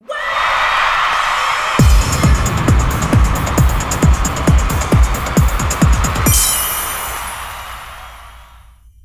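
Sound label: Jingle Fashion; pretty rhythm my deco rainbow wedding; 3ds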